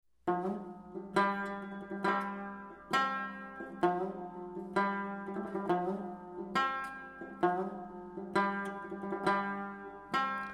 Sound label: lute